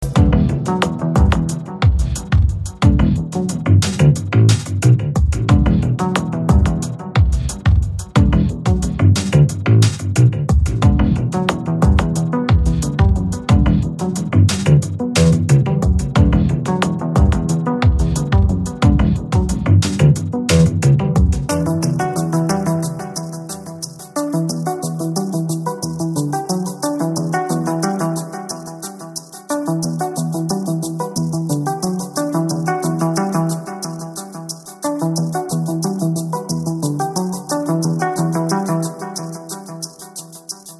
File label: Minimal Ambient